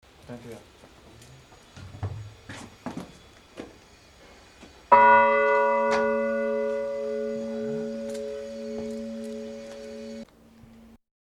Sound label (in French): cloche; Claira, cloche 1